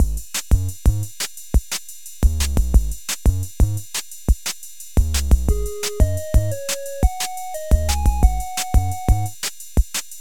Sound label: forest ringtone